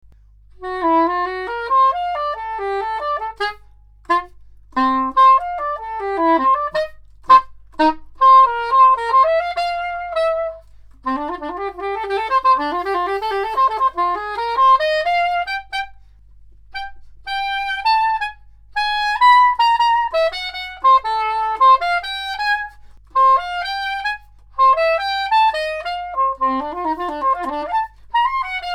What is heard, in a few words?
soprano saxophone